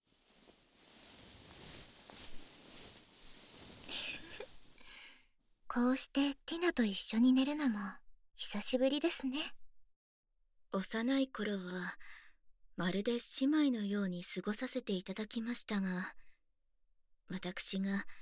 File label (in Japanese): 左右同時 くすぐり ASMR; プロローグ【ASMRパート】